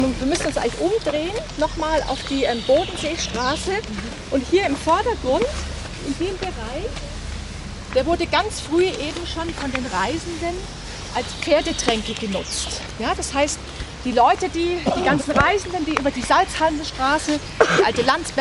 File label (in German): Frühere Pferdetränke